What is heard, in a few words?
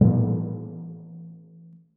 timpani p rh a1; Percussion; Sonatina Symphonic Orchestra